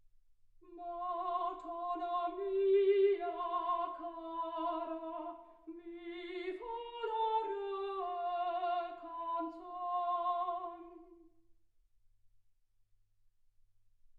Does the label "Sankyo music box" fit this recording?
No